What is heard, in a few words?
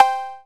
Cowbell; EMU Xtreme-Lead-1